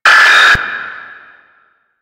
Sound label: Screen Flicker; Warframe; Duviri; Easter Eggs